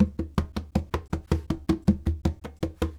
Conga, Latin Percussion

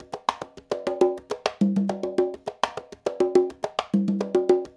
LOOP, Percussion; InVision Interactive; Percussion Slam; SULTAN

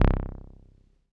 505, String Machines

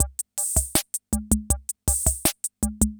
CR78; Best Service; XX Large Most Wanted

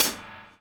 DOWN, DOWN KIT 78

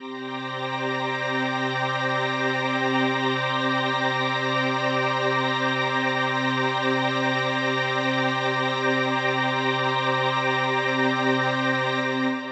Ambi String C7:9, synth orchestra

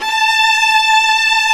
String Vlas Sect Agitato; Vas1 Agitato

